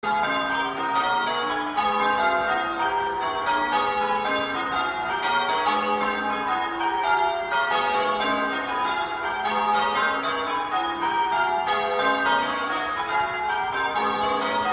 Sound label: Bells Bristol Surprise Major; JSB funeral